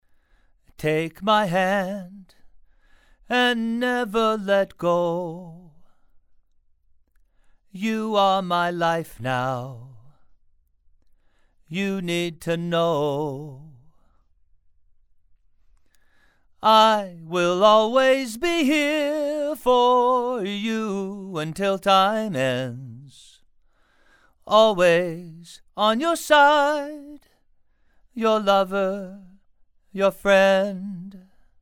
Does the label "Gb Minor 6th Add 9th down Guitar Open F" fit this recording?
No